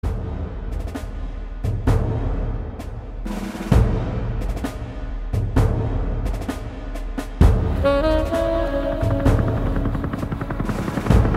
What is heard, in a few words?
chill mix